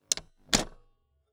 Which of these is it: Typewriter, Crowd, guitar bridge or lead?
Typewriter